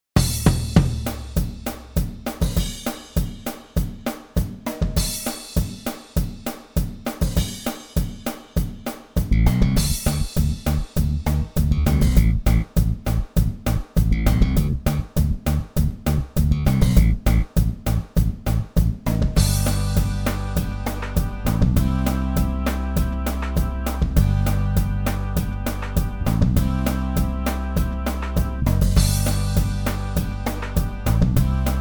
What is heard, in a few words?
Sound Machine, instrumental; rapidea records